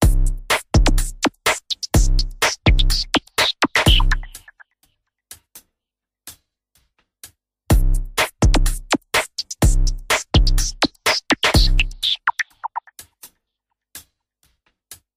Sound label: nokia lumia ring